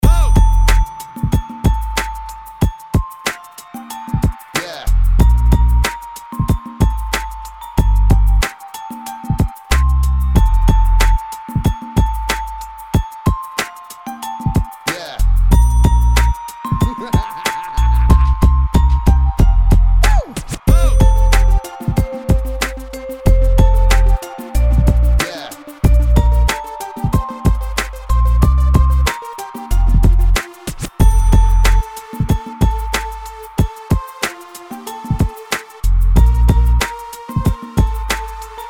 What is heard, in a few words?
jingle bells r n b